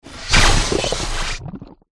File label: jelly fish boss